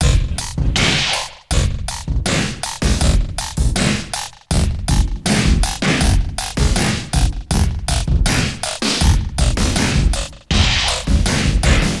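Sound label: Loop, beats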